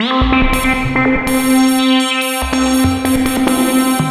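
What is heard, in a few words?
sample and hold WORKER C4; Best Service Dream Experience; S + H SYN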